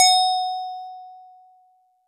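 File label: Metal Tube DX100 F sharp 6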